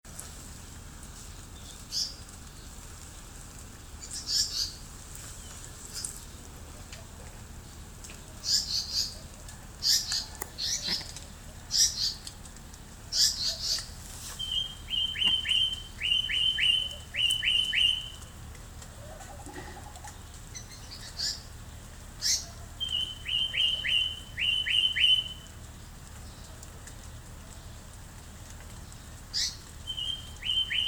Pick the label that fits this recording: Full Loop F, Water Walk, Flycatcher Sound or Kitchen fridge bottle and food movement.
Flycatcher Sound